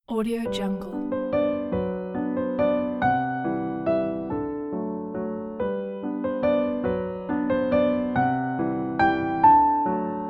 cute little piano waltz

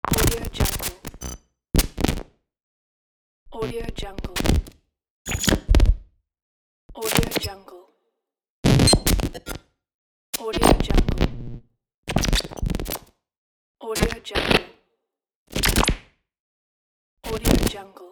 glitch logo transitions

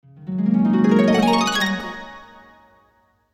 magic harp

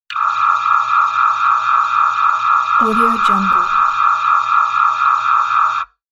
signal noise

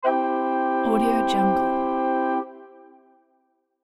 irish whistles in harmony

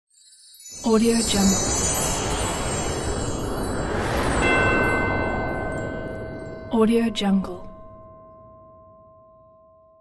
christmas whoosh; archimusic

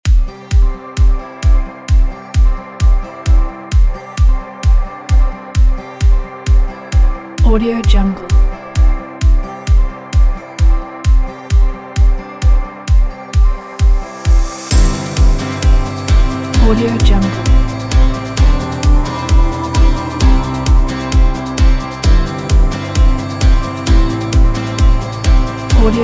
upbeat indie folk kit

